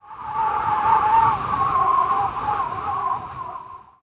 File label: wind snippet; ambient; Counter Strike Model Pack; CS:GO